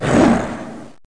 attack zombie

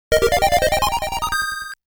Extend Jingle; Aleste Collection music album

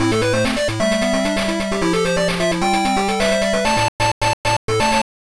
Success; Pocket Monsters Suuji o Tsukamaeyou; Pico

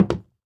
Footsteps Sneaker Wood Walk; Infernal Radiation

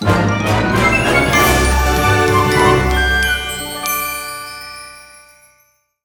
Chest Chime; cat quest ii

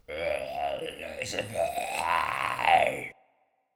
attack; baal

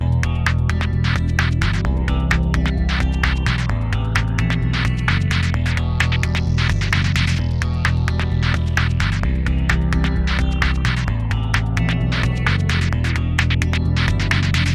alarm; Hard Truck Apocalypse; Ex Machina

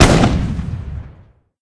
Cannon Shot; Angry Birds

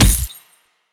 Boss Chain Kick; helltaker

